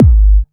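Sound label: BD Sub, bass drum; Club; VEC1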